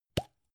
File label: gum plastic box open